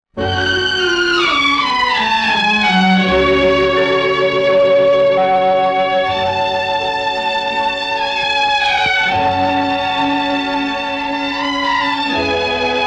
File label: Sad Theme Instrumental